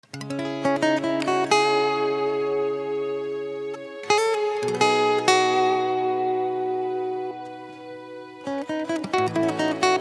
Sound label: Love Pop